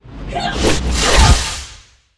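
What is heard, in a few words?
bee attack, monster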